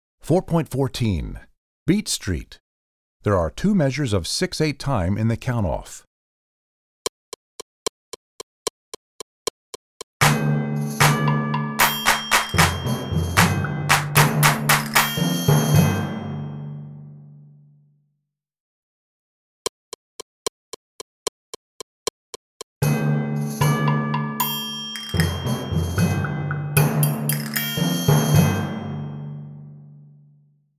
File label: Beat Street, Percussion; Percussion Book 2